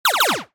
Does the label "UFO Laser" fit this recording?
Yes